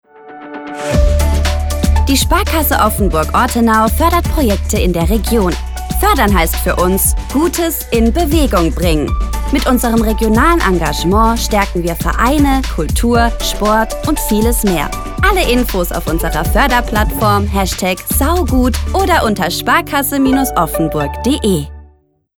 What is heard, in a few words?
Event Jingle; Stadionansagen – Sponsoring; Sparkasse Offenburg